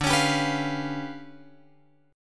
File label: D Minor Major 13th